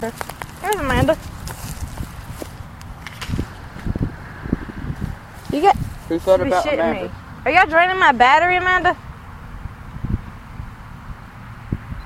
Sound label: heavy breathing